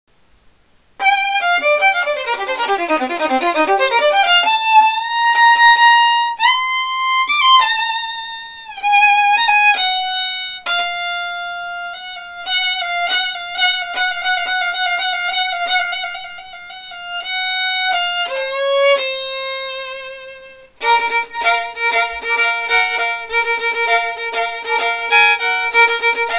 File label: Improv Jam, violin; Mr. J violin solo: Some Improvisational "JAMMING"